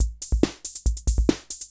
140 POPS

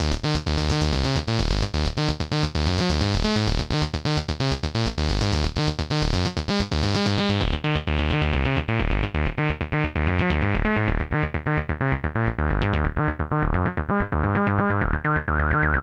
FUNKY, SYNTH SEQ; AMG; Global Trance Mission VOL-2

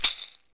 PRC BASKET, AFRICAN PERC; Roland LA

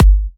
Electro Kicks; Vengeance - Essential Clubsounds Vol.5; Bassdrums

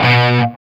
C 4 SOLDANO, Guitars, ROCK; Spectrasonics; Hans Zimmer Guitars VOL-1

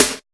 UNCLE SNARE; R+B KITS2

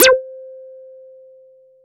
Synths BASS

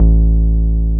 303 SUB A2M, SUB BASS

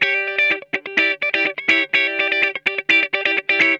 E PEDAL B, Funk Guitar; 90 s Sample CDs; Zero G

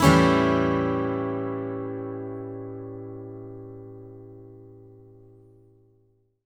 ACCGTR 7TH; Country

